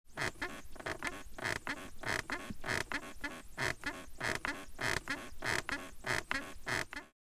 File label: Squeaky bed constant movement